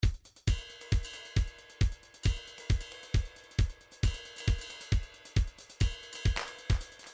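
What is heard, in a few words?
trance midi loops beats, flam cymbal; Newtronic Trance Constuction Kit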